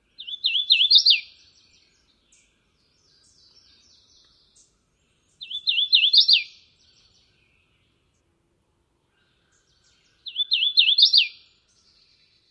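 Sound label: Hooded Warbler, songs number 2; TN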